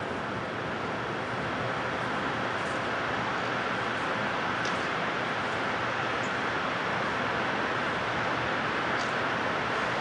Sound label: Rufus-sided Towhee; evening